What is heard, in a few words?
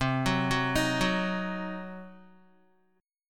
C Diminished, Guitar, chord; arpeggiated; JGuitar